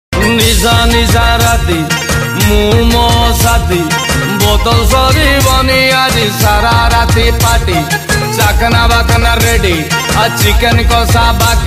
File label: Odia Ringtone